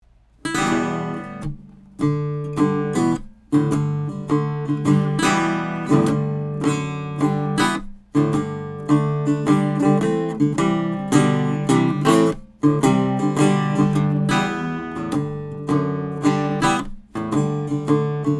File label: Harmony Em; sE RN17; Metric Halo ULN-8 converters; 1930 WARDS AIRLINE FLATTOP